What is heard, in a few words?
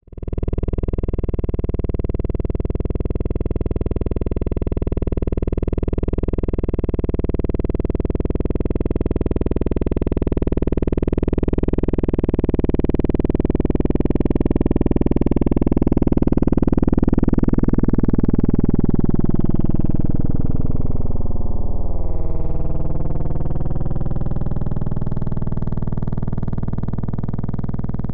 flyover Synthesis at Source One Half Deg Resolution Source Noise